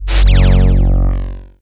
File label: Boss Attack